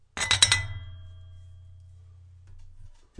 found sound forkoophone; UNUSUAL KITS and FX; Werkstatt Found Sound Kit

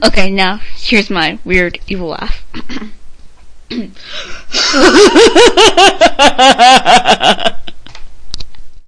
my weird evil laugh, female; MISC and SPOKEN WORD

